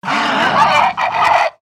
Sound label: pain, zomb female; nmr